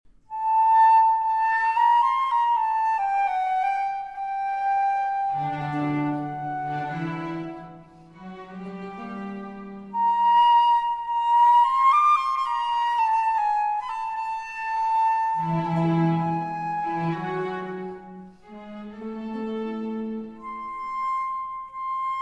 Concerto in F Major For Flute & Strings, La Tempesta di Mare